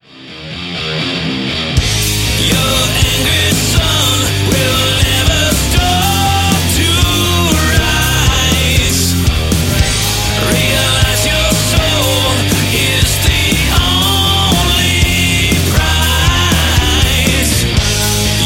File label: METAL